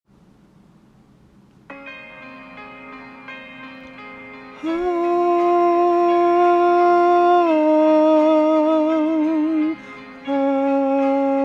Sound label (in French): vocalises haute